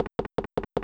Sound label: Quick stairs; cutscene